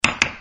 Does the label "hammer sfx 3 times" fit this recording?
Yes